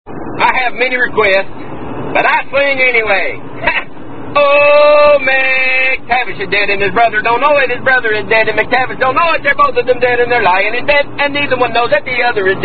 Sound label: Camp Song; McTavish Is Dead; Tune Irish Washerwoman